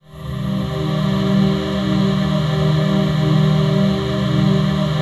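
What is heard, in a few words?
chord pad, Pads; Best Service; XX Large - Pads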